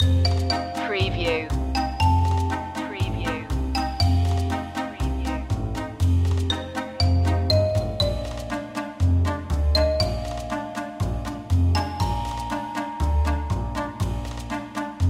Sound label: candy sticks long looping